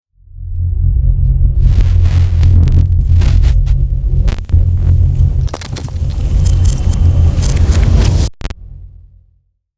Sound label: Bass Glitch Logo light version